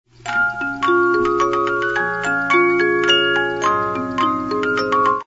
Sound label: Menuet, music box 50-Note